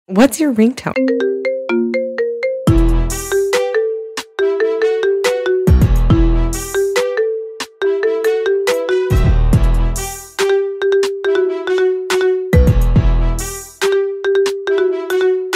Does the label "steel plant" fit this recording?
No